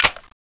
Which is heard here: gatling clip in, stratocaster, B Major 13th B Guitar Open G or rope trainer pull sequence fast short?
gatling clip in